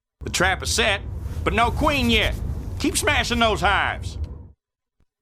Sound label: Miner reminder (cinematic)